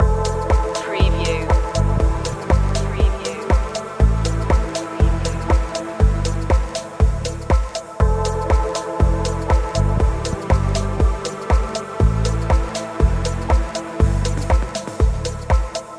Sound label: light spin loop